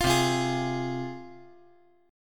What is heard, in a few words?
C Suspended 4th Sharp 5th, C, down, chord